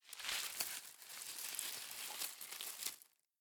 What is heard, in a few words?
leaves, foraging